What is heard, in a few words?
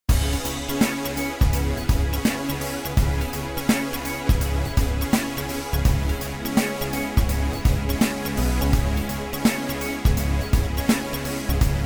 Melodic Electronic